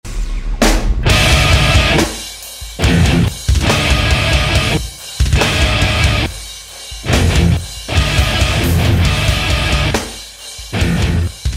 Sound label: escape failed; zombies